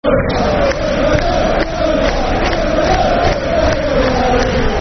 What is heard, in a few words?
Football Chant (Soccer Song); Super Neil; Millwall Mfc